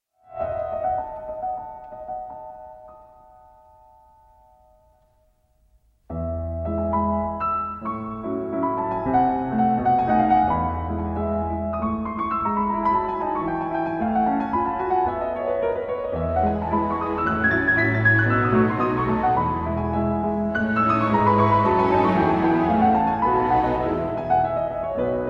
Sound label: divertissement in E major for piano and string quartet